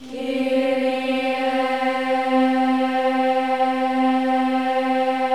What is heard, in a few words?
KYRIE, Symphony of Voices